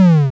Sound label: damage decrease